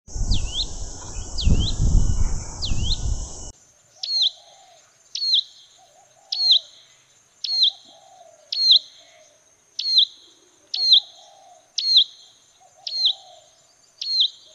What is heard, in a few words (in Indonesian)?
suara asli perenjak coklat